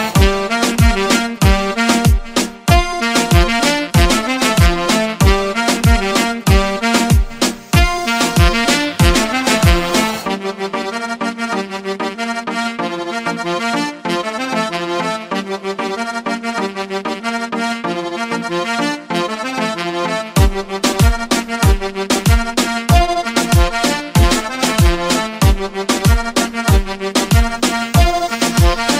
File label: raggaeton remix